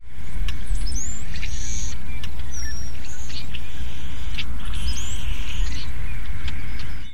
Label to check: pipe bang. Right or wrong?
Wrong